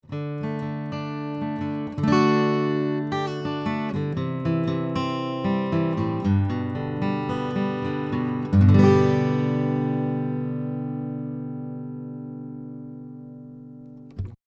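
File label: Acoustic Guitar Picking, 25 cm, 15 Fret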